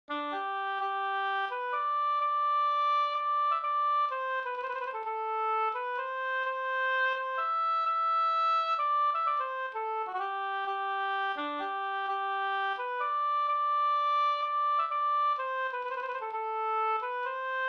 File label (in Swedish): hamburska